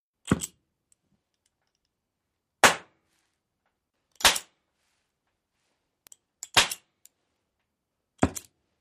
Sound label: Welding, oxygen - acetylene, various ignition pops (5X)